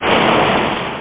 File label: Flames; Amiga 8-bit Sampled Voice